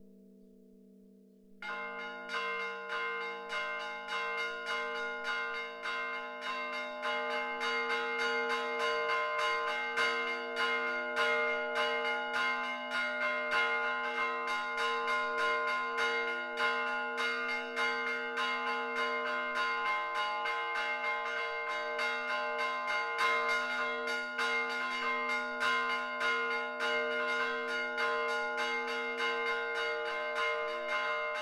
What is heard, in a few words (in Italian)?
SUONATA per la novena, campane; Le campane del campanile di Santa Maria del Piano